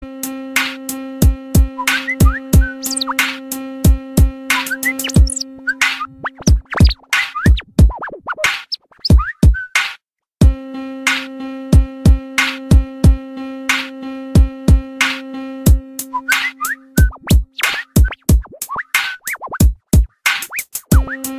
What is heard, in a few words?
Whatsapp hip hop; toque de celular